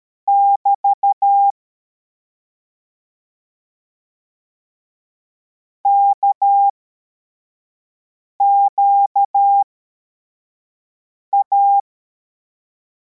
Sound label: cw, morse